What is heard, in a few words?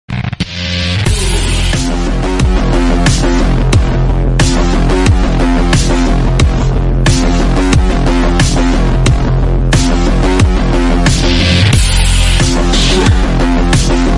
Gaming Bgm; Cyberpunk 2077